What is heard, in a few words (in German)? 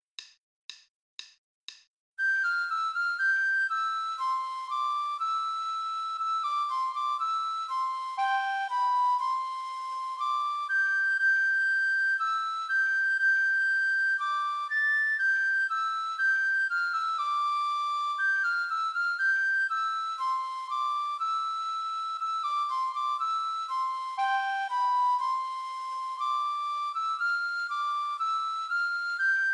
Floete 2, Spielmannszug; Froehliche Weihnacht